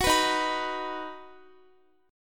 D sharp Minor Sharp 5th D sharp down Guitar; strummed

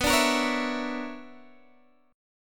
B-Minor Major 11th, Guitar Half Step Down

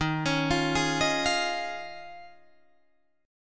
Eb 9th Suspended 4th, Guitar, Drop D

